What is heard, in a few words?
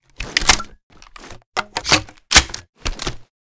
b725 reload empty, weapons